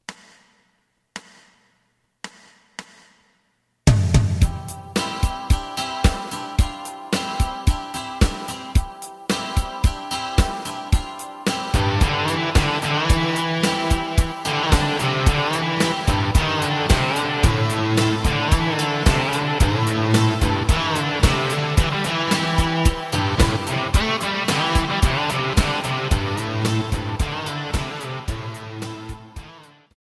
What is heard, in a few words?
bass